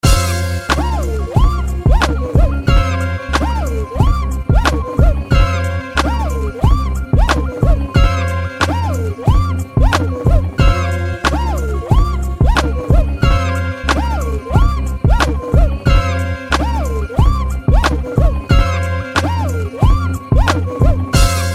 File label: beat